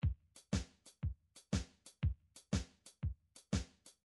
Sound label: midi drums gumbo